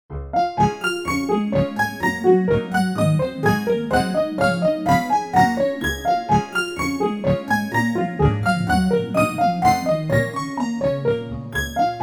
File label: waltz; Light2Art